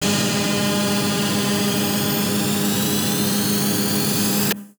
Trailer

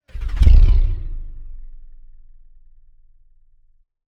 Jet Plane Passby

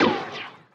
Casual Power Strike

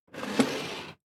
Tray Drag Friction Slide Crockery

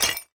Glass Clinks And Clangs

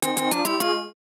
Melodic Retro 8-Bit Chiptune

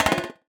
Uncanny

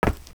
Leather Shoes Concrete

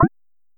UI Popup Click Button Menu Navigation

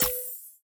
Sparkly Menu Button Click

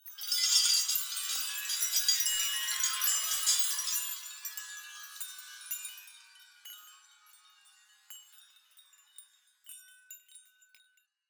Chandliers Swaying Dry Horror

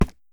Footsteps Concrete Run

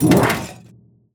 Heavy Metal Sheet Shake

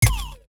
Casual Thin Gunshot

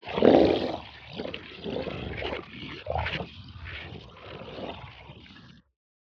Monster Beast Creature

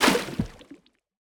Water Throw Pour Large Quantity

